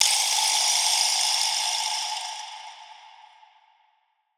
vibraslap; autodrummer

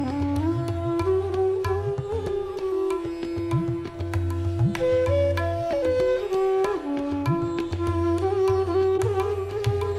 Shivranjani, hindustani raag

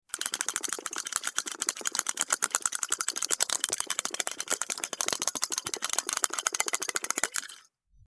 bubble and sprinkles

